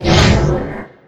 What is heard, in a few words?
Pickup Scifi